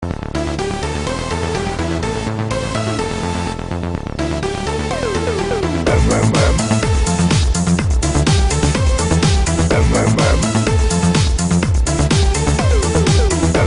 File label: ringtone